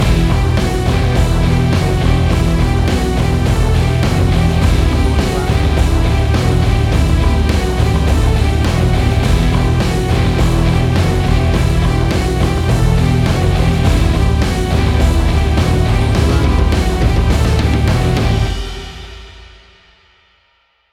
Big Epic Heavy Metal Action Music; AUDIOLANDER

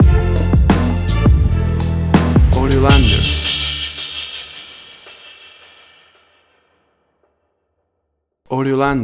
Torment Orchestral Hip Hop Stinger

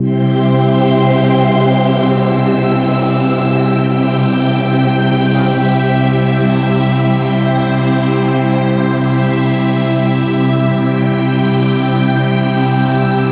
Atmosphere of Dreams

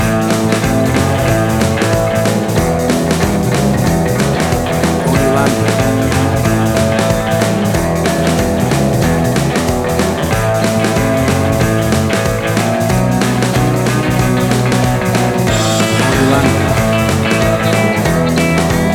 1960s Pop Club, royalty free track

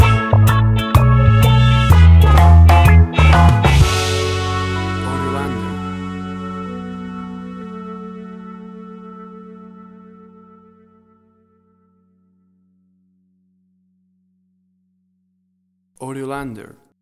Reggae Summer Island Music Stinger

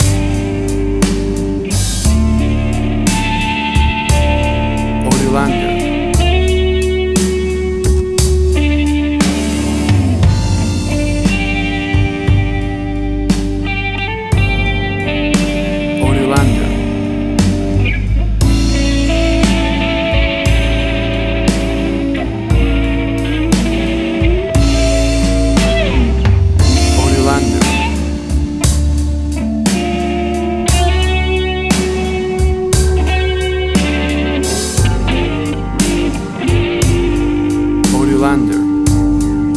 Blues Spell

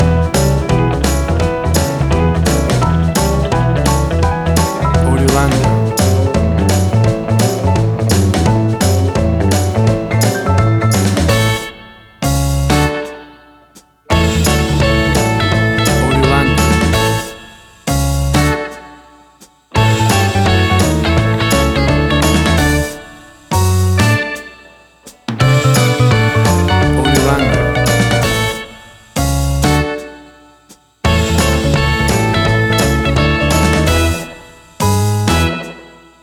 60s Bounce Pop; 60 SEC

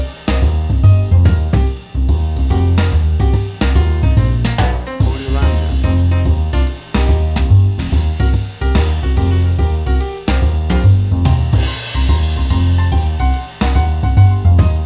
Blue Corner Jazz; Blue Corner Jazz Full Mix. Cool jazz music with jazz piano; AUDIOLANDER

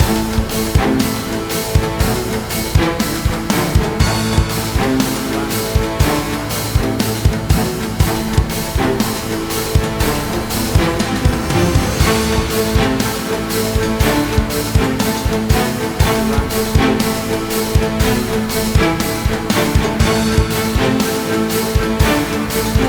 Big Epic Music

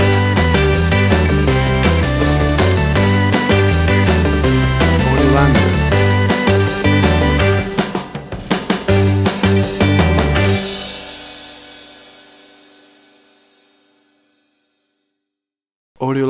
60s Night Spot

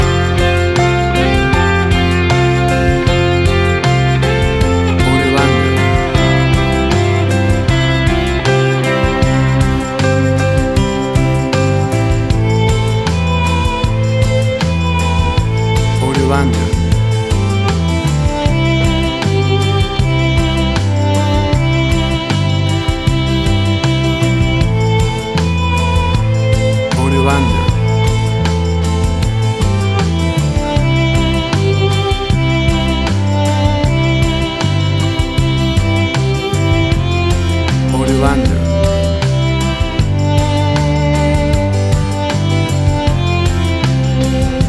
Country Rodeo Cowboy Ballad Music

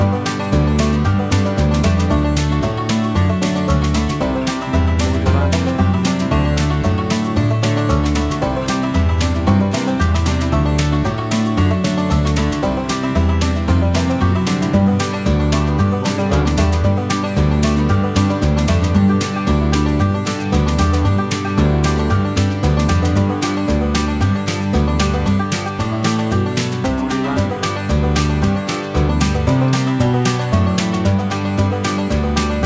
Bouncy Country Fiddle Music; 60 SEC; AUDIOLANDER

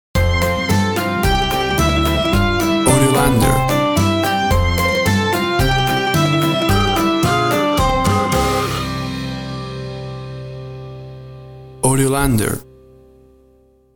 Uptempo Silly Happy Music for Kids

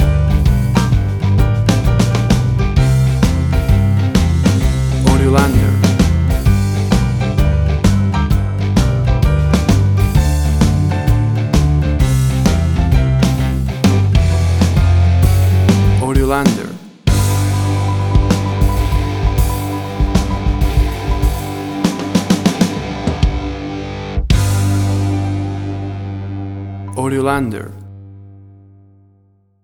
Boogie Woogie Bar Blues

